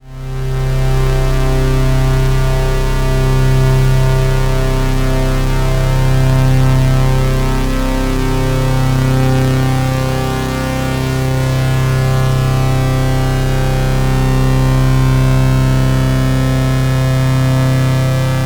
Shepard PW mix, shepard tone; experimental

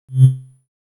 Soft, synthlead

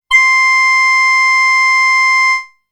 Oboe C

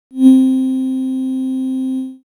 Soft, synthlead

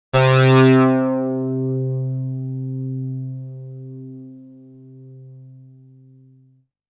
synthlead

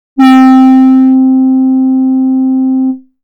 Basic Pulse, synthlead

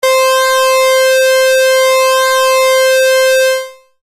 Pwm waver, synthlead

